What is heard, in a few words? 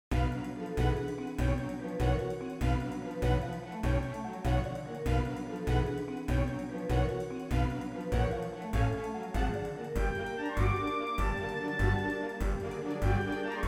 Deep Dusk Forest Remix; PMD- EoS